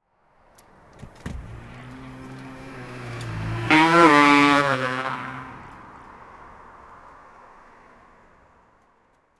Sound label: car crash into the dere, winter